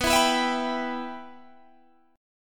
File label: B Suspended 4th Sharp 5th B Guitar Half Step Down